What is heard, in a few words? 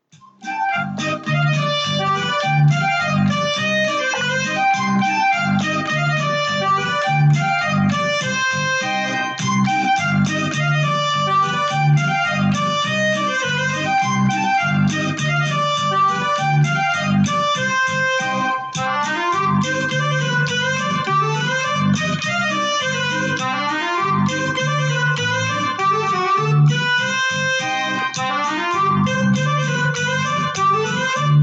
polka